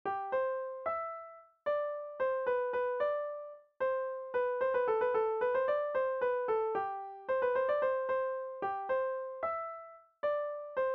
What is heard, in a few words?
melody